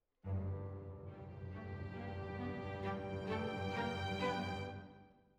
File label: Violins Over